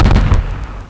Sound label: EXPLO; Flying Nightmares Demo